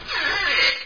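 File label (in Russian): звук скрипа двери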